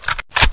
awp clip in

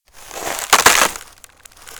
endothermic freeze, explosions

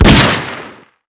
elite; weapons; 2elite

